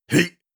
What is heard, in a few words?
effort grunt male